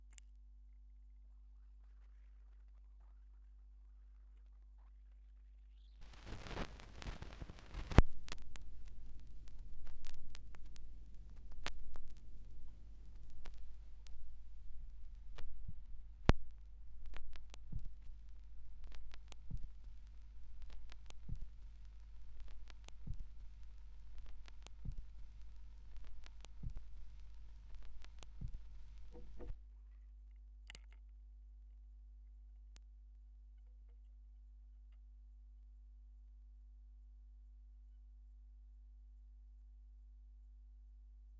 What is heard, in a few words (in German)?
schallplattengeraeusche